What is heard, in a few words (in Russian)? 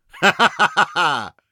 men smeh